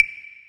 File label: clave